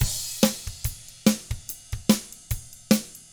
ROCK; ILIO; Double Platinum Drums 1

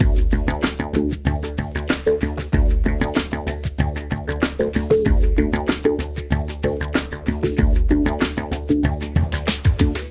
ABO WDMIX, Liquid Grooves; Spectrasonics

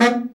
BRA Bari, SAX; Best Service Gigapack 1; BR SAX 2

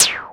Percussive, Drums Perc One Shots

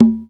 Congas, African Contemporary; Spectrasonics; Supreme Beats

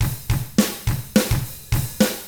105 POP RK, Drums; ILIO; Double Platinum Drums 1

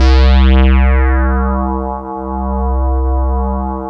PULS LEAD; MORPHEUS

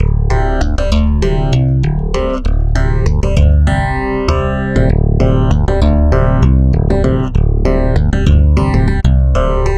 Stick Loop, Stick Bass; Sonic Foundry (Sony Creative Software); Leo Cavallo Bass Taster

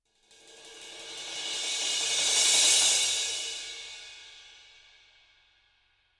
CYMBALS; Sampleheads; Peter Erskine Living Drums; SD CYMBALS

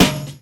Snare, Percussion Hits, Snares

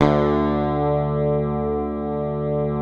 PICKED, Bass, D2; Sampleheads; Will Lee Bass Library

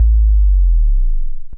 bass, Hardcore Breakbeat, 151, Ebmin; Big Fish Audio; Hardcore Breakbeat Action; Rhythms 4 Life 151 Ebmin

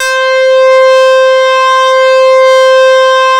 leads; Masterbits; Vintage Voltage

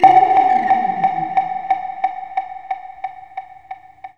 REVERS TAP; USB Soundscan vol.27

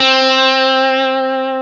GTR TUBE; Dirty; Club-50 - Foundations; Paul-Stack

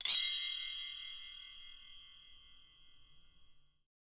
Percussion & Harp; Miroslav Vitous

